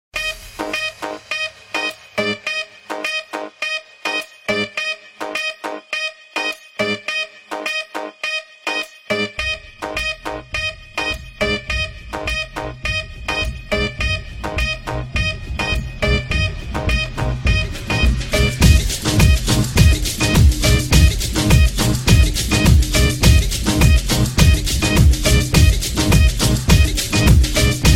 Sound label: samba